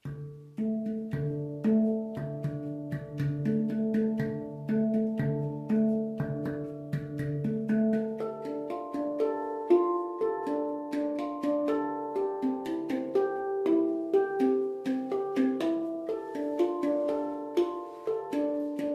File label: handpan